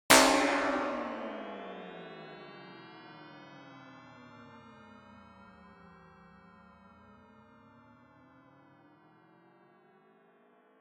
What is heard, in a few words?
50 notes harpsichord glide